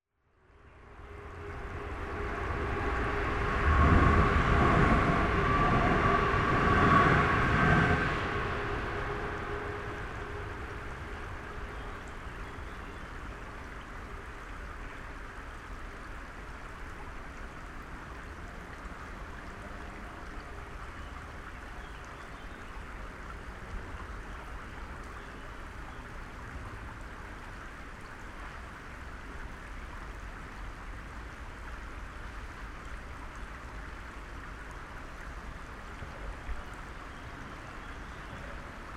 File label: Ravensbourne Pool Linear Park